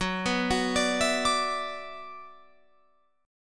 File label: Gb 7th Suspended 4th Sharp 5th Gb Guitar Open F, Gb7sus4#5 Chord; Gb7sus4#5 arpeggiated